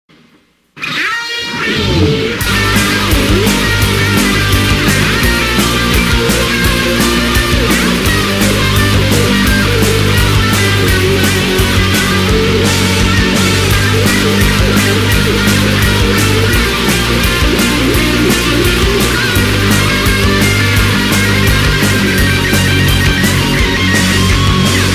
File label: Is Calling Pop